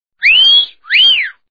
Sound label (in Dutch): fluiten